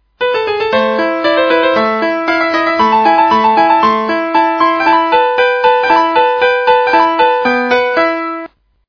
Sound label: Rondo Alla Turca; 门铃音乐; 土耳其进行曲